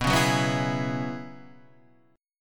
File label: F sharp 7th Suspended 2nd Suspended 4th, B